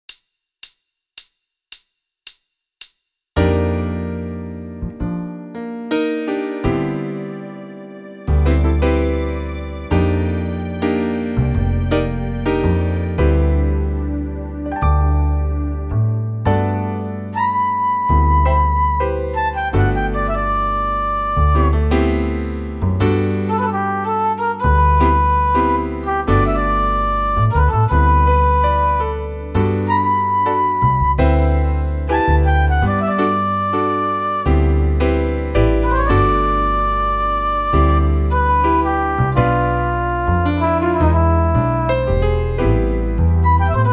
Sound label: Jazz Waltz; V-Drums